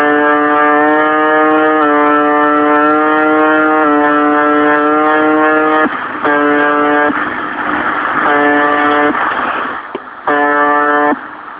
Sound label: duotone 4625